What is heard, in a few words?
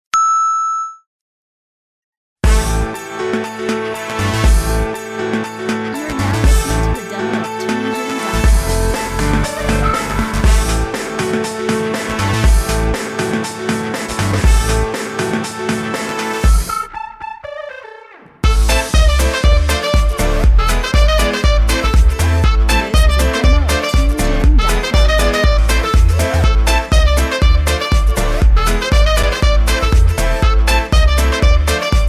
dirty jazz